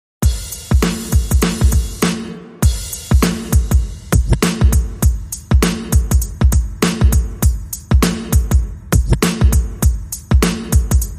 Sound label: Drums Only